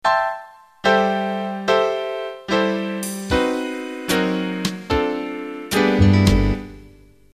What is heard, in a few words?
Big Band Ballad Intro